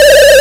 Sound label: Arcade Riffs